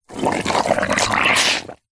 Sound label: foley liquid wet squelch bubble gungy slimy